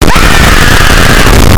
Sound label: scream